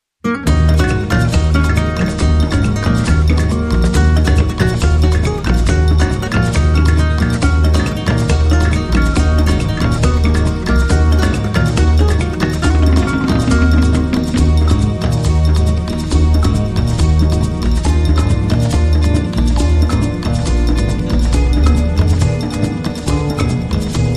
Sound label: No Flute